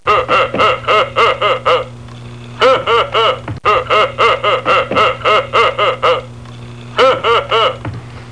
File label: laugh